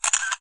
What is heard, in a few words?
Shutter Sound